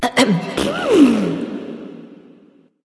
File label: tongue light; smite; voicepack; hel